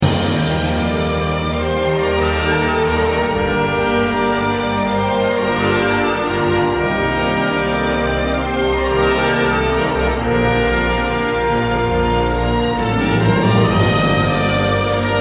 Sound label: Orchestra Instrumental Version; KINGDOM Tres; KHIII